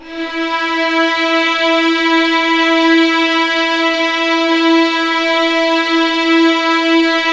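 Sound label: E4 legato violins; East West; The Ultimate String Collection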